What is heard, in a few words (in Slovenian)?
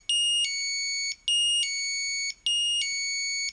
zvonjenje ring tone